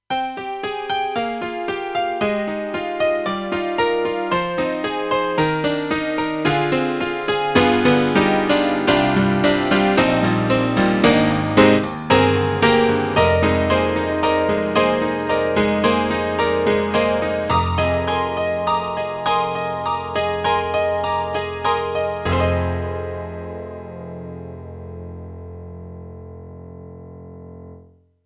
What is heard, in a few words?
KORG X5DR Honky Tonk